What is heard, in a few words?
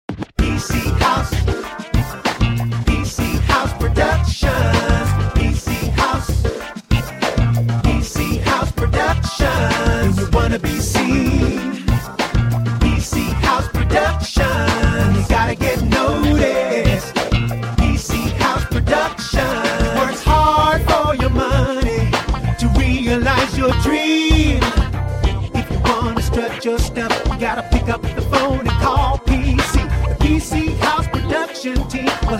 Jingle